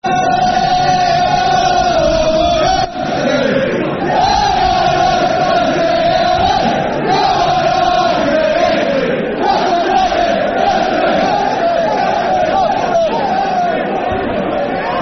Spell It Out, Football Chant (Soccer Song); Newcastle United Nufc; fanchants-com

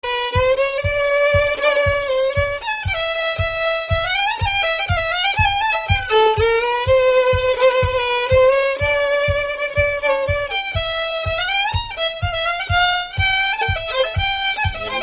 Tune In F & C; Nameless Tune

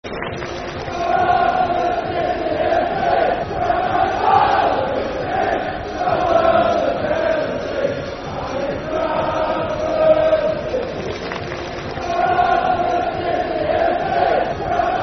Bradford City Fc, Football Chant (Soccer Song); Bradford City Bcfc

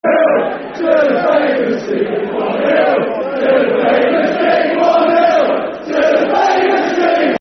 One Nil To The Famous Team, Football Chant; Nottingham Forest Nffc

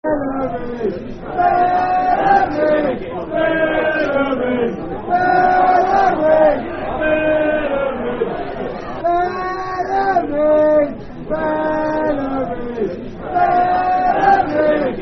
Football Chant; Bellamy Wind Up; Fulham Ffc

